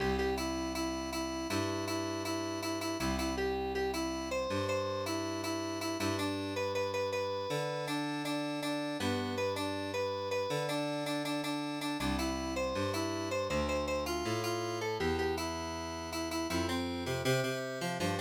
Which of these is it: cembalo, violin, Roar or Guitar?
cembalo